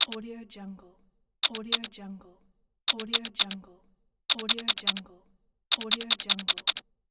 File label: camera shutters